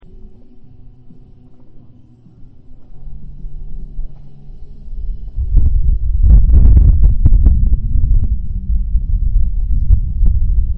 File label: soundscape quadrangle sound; seeley